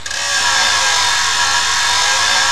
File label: saw; Roland - LA Composer vol. 1